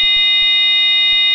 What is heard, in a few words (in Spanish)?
tono; sirena multitonal 8 sonidos o 70mm 24v cca; Módulo de sonido Ajustables